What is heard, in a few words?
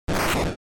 Noise; GB General